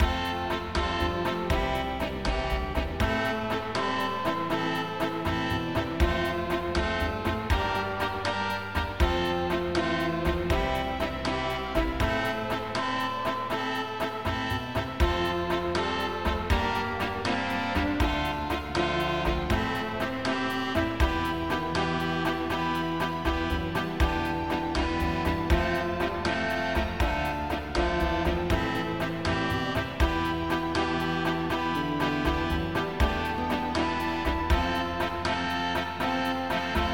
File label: melody Japanese Pops